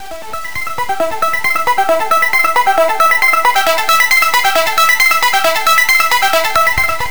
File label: Techno Synth